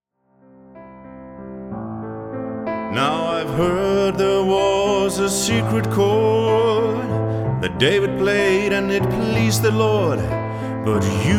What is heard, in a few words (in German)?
Halleluja